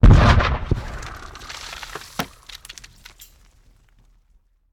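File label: Cannon Cannonball; Sound Ideas; Guns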